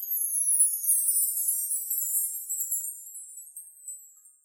sparkle

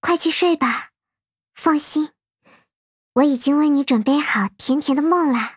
applause; osu

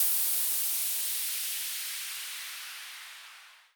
Sweep down

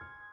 piano high

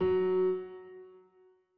Piano (F#2)

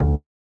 bass short D3

